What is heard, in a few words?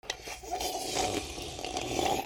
PEOPLE